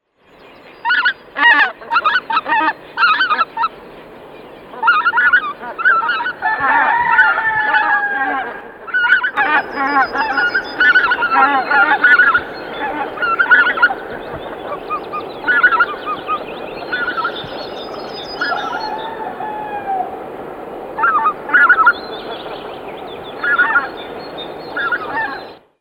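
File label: greater white-fronted goose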